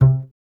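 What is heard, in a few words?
STR CBS, Solo Instruments, double bass; Miroslav Vitous; Solo Instruments VOL-2; Solo lnst. II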